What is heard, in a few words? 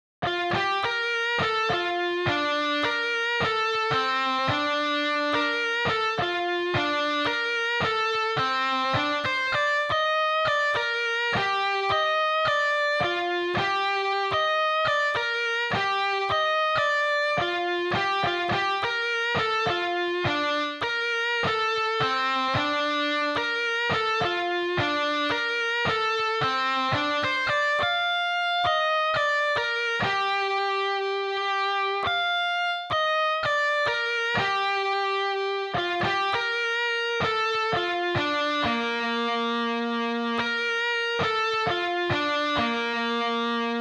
Real Piano